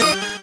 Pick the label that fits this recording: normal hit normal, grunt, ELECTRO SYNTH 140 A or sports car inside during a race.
normal hit normal